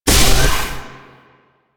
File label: Door Open; Warframe; Quests; TheNewWar; Ambience; Drifter Camp; Ambient Gameplay; Liset